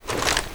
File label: foley gear rattle sprint